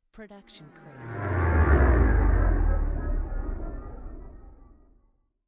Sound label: star cruiser flying; soundscrate